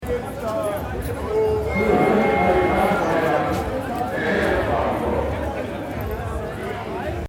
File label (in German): Gegroele